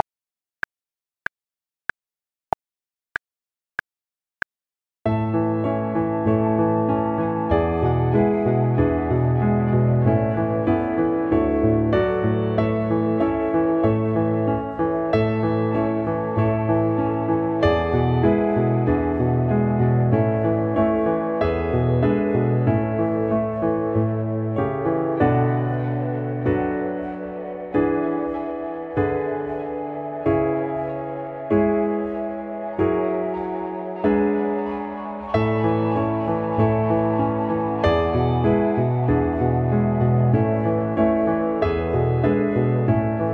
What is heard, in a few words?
95BPM Backing Track